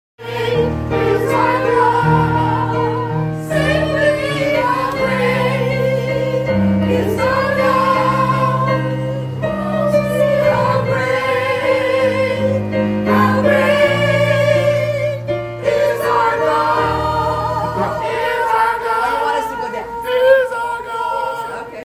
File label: soprano, choir practices